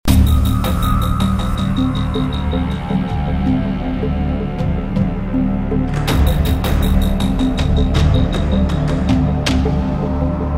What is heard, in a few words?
Cine Tense loop